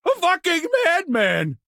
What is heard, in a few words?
town human far; Blind Drive